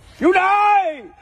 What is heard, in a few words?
cow come